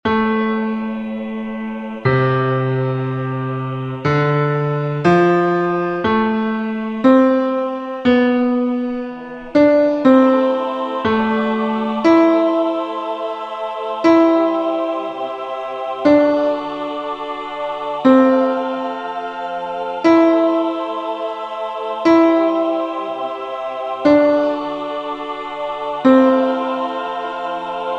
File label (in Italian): piano Tenore